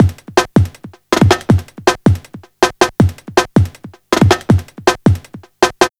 160, Drum Loops; Total Drum&Bass

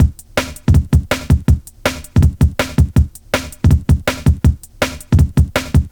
Loops, breaks; M8

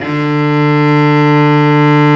STR VC Arco Solo p

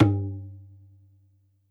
Tabla Open, YAMAHA RM1X

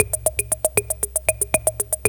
CR-78; AMG - Now CD-ROM (Roland)

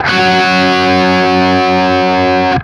5TH F#, Guitar; East West; Steve Stevens Guitar Samples Collection

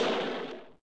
Noise; SNES; Brass Numbers

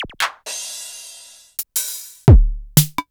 Korg Electribe ER-1 Kit

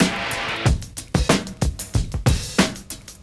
DRM CHOP 93; AMG Gota Yashiki Groove Activator

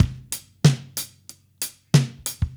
Mixed Berry Jam Drums, 70s Analog Funk; Sonic Foundry (Sony Creative Software) - What it Is! 70s Analog Funk

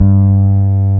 DIRTY BASS, Electric Basses; PlatinumPhattCD2; E-MU Producer Series Vol. 8 – Platinum Phatt

